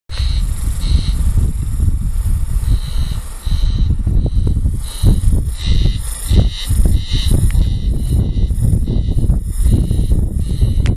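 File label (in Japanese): haruzemi, spring cicada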